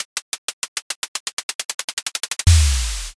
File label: drumroll